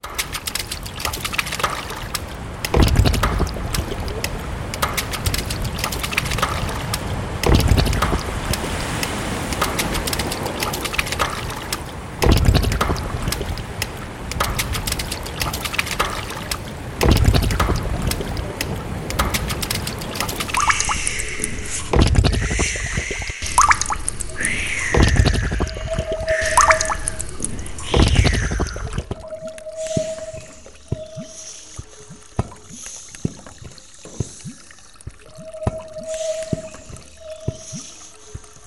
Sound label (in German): Wassergeraeusche